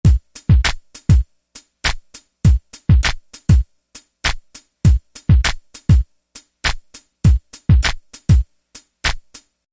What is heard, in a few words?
Drum Loop